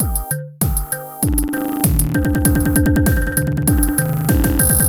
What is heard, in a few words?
loop 98bpm